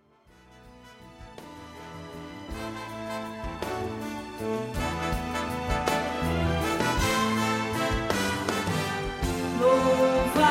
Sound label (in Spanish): Contraltos; cantata navidena